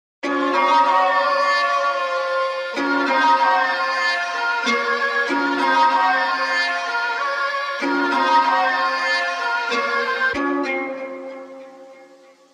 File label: Ring Rap